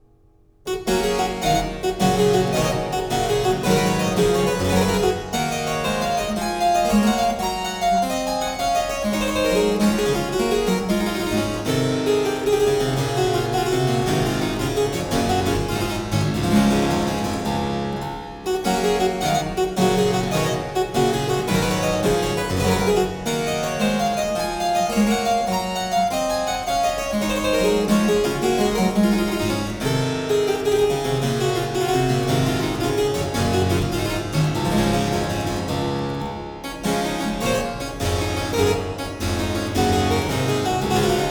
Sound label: Suite in G Minor, Harpsichord music; Suite 2; La Choquante; Joseph Bodin de Boismortier